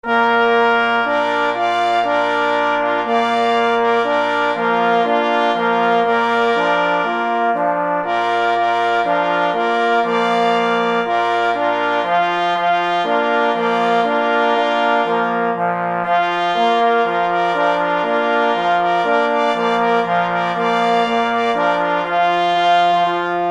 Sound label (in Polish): Hejnal, zespol rogow mysliwskich; K. Kadlec; Hejnal OFMM; Brodnica 2016